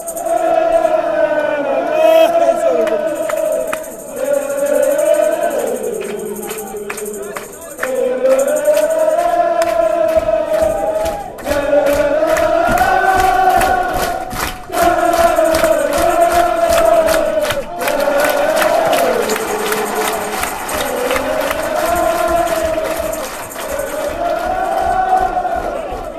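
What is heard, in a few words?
fanchants, football chants; dundee united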